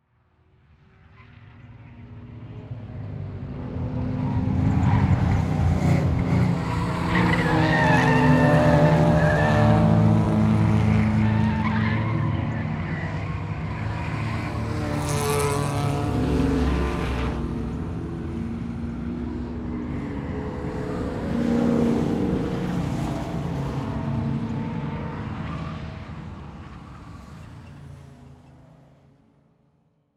Transportation Car Race Car Drive By Multiple Speed On Turn